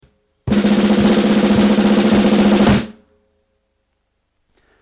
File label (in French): tambour; mondalazac